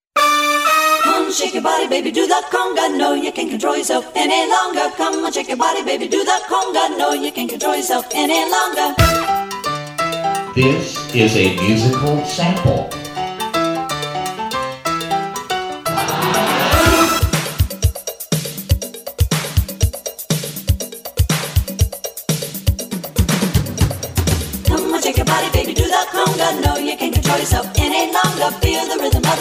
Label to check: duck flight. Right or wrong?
Wrong